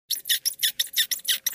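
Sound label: cartoon squeaking multiple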